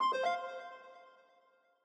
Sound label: Notify Email; Longhorn Valley Sound Scheme by David Mounder